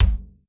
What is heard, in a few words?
Kick, Yamaha RY20